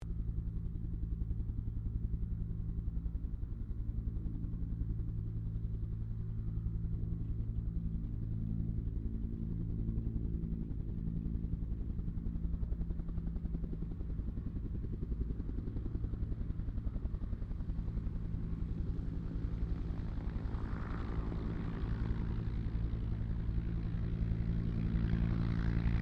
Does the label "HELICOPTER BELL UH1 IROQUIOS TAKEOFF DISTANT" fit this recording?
Yes